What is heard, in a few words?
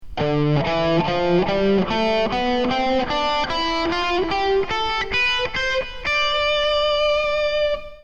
dorian scale